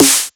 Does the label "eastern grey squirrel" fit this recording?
No